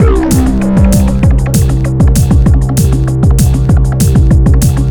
techno; Google; NewWave Labs

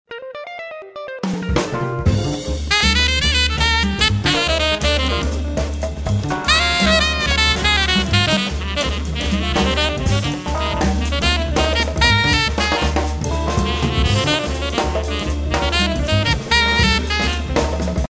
Jazz Bar; Samsung; SGH-T746 Impact

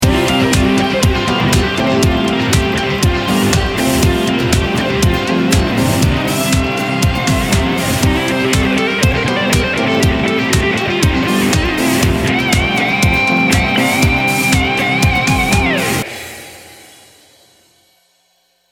Stadium rock, ringtones

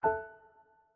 Lock

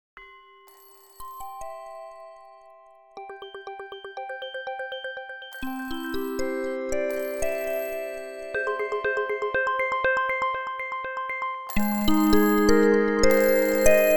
Cycle Oscillation, alarms; Vivo X80-Pro

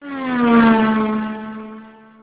shutdown; Audiovox CDM-8912; Startup and Shutdown

